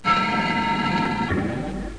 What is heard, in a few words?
ROCKSLID; MADNESS of Roland